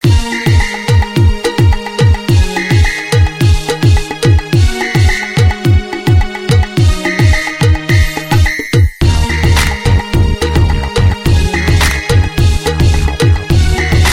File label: INSTRUMENTAL